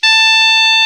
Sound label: KLARINET, Alpine Volksmusik; Best Service